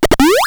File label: single heal; P3D-Legacy